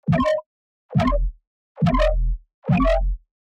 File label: really cool womp, bass